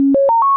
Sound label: startup